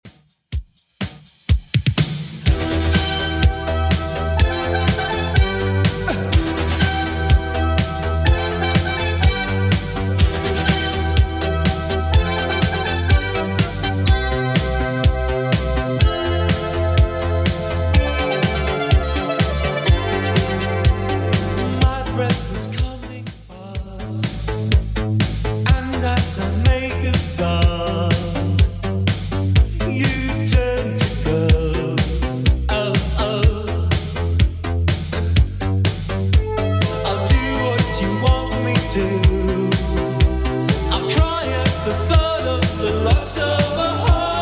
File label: 80s Redrum 124bpm